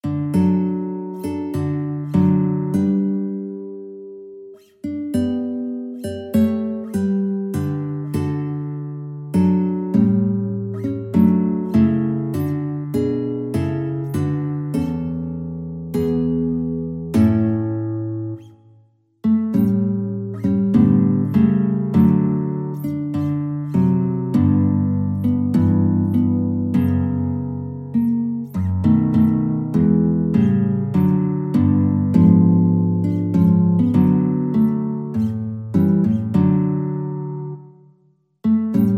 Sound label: danish, guitar